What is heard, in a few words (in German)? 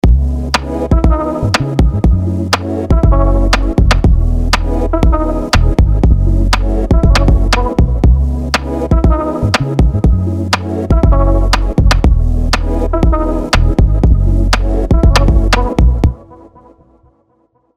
Song Bassroom Mixroom und Limiter